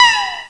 PIN

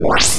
Synth Whoosh

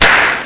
punch

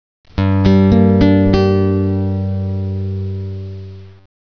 guitar

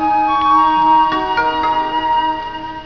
Startup; Chrysanthemum 1.01

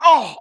wounded, English Voice; The Sum of All Fears

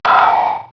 slider

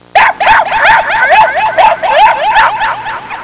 puppies in a tunnel; pets; Sound Effects for Multimedia - Volume 1

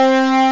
tranz sin; The Epic Collection 3; Epic Marketing; muzak samples

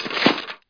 step rubble; SpecOps2 MPlayer Demo

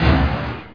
BOAT; powerboat; Smash Disk

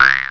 jews harp

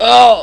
Argh; McPoet 3.1

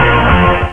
guitar riff, string instruments; Sound Effects for Multimedia - Volume 1

